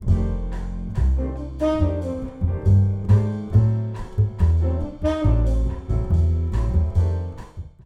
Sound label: Bass Mic DI Raw Inv